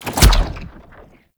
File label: Infested Lich Virulence Impact; Warframe; Abilities; Zeke